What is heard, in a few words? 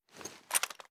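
sniper reload movement; gmod; viper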